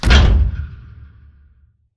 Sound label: pod door ext verb open stop; Titanfall 2